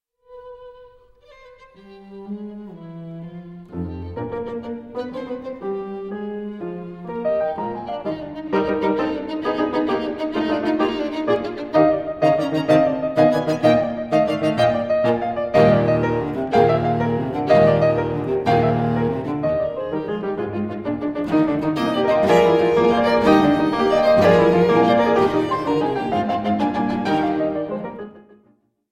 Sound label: Trio for piano and strings in E flat major Scherzo; ludwig van beethoven; 3rd mvt